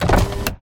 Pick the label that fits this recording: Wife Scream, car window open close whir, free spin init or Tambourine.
car window open close whir